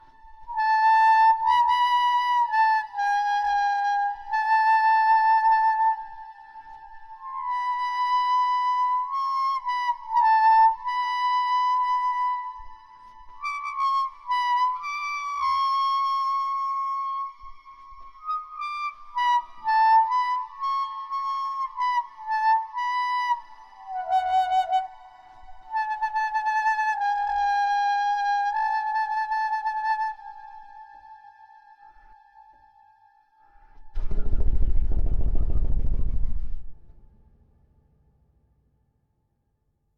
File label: Reed; Epilog